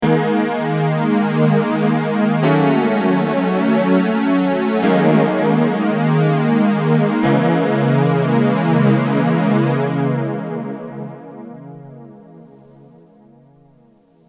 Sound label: m1000 ibanez fl99